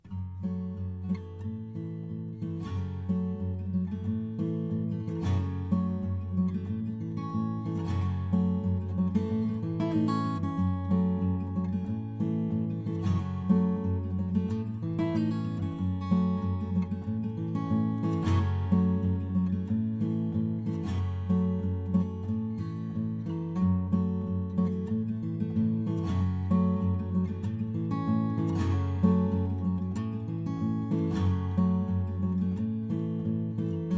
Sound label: em g changing